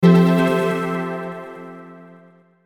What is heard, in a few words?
Hit Sweeper Delay; Sweepers; Blastwave